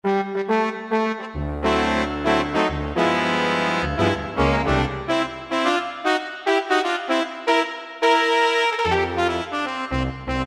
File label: BR Class, Yamaha TG33; Factory Presets